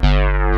SQUARE BASS; Pro-Rec - Synthsonic; BASSES

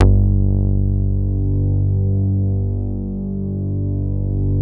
MOOG BASS G2; Best Service Dream Experience

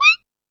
Dance 2000, Drum Machines E-MU Pro-Cussion

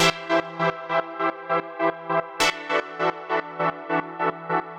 Pad 100 E, sidechained

